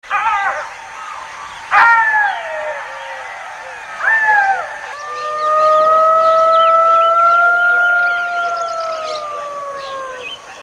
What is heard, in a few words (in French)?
chacal cri, cris d animaux